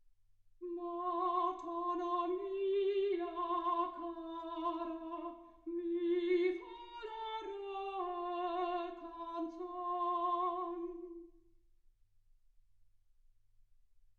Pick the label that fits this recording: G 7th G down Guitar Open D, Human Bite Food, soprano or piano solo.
soprano